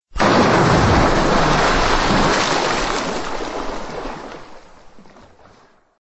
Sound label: Slo Mo Splash